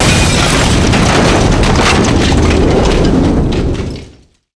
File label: explosion metal